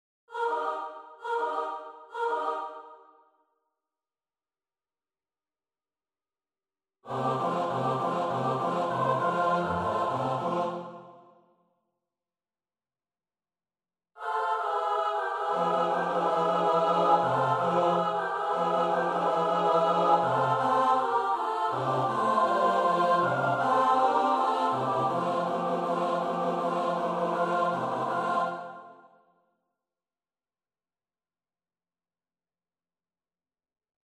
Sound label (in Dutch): S-A-T-B, koorpartij oefening; Wagenaar (Johan); Jupiter Amans